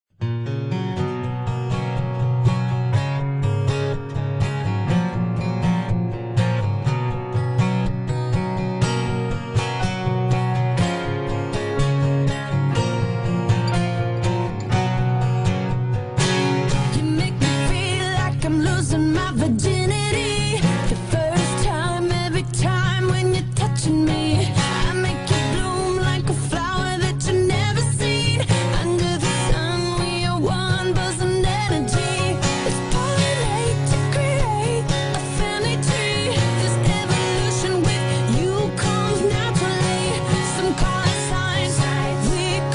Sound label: acoustic version